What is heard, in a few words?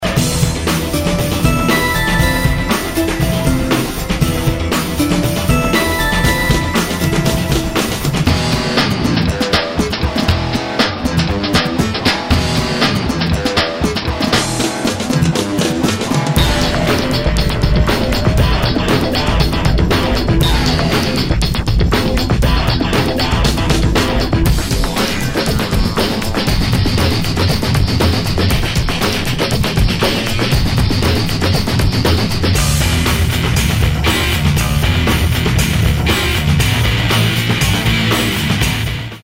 Keys and metal